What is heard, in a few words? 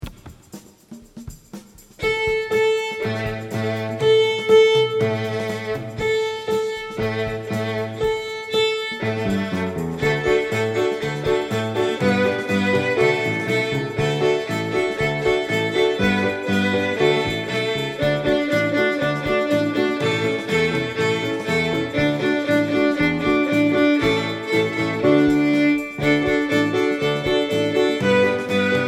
Folk Dance